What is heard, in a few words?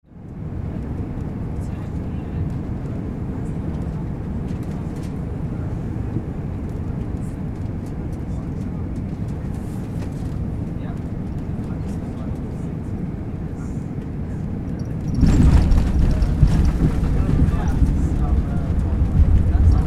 airplane cabin inside landing